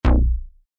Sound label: Bass Samples trance